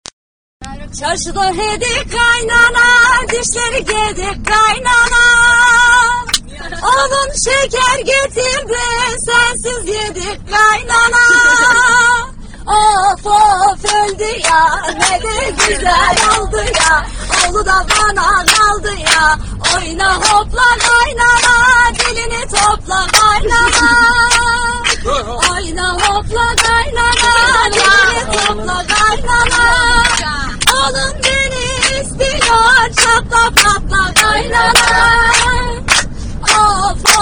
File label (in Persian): Torki Ba Sedaye Zan; صدای زن